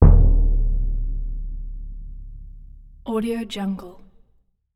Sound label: bass drum hit